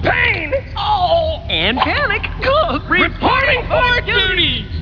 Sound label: pain